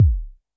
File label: korg trident